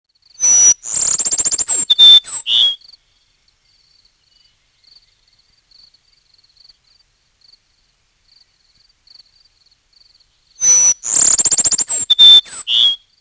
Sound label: cassins sparrow